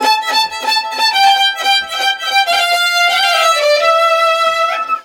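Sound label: Balkanish Traditions strings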